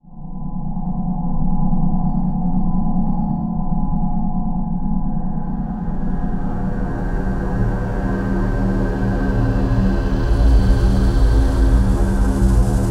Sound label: Dark Ambiences and Textured Sound Effects; Neosphere; Bluezone